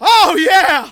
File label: VOX GENERAL; Megabass Remix! 2